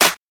Airy Sizzle Snare Single Hit F# Key, acoustic snare tuned to the F# note